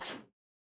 retro game laser

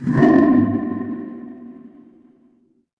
horror growl

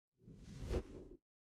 transition whoosh

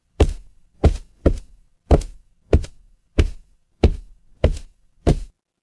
footsteps wood

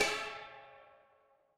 instrument gong

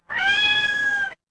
animal cat meow

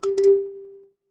feedback confirm

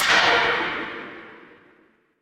magic scifi laser

